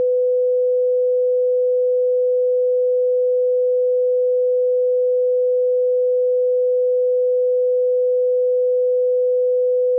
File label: noise 500Hz